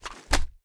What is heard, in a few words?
raise quick up, sh; gmod; wfoly plr sh charlie725